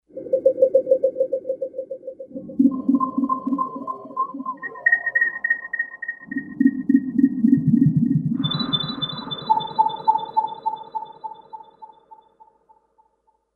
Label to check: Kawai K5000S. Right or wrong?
Right